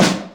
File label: YAMAHA R5+RX7, DRUMCOMPUTER; Retro Sampler